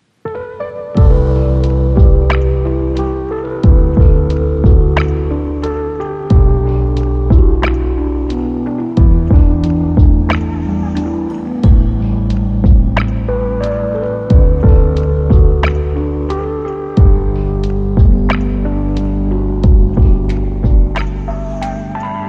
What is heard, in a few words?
Lofi Mellow Jazz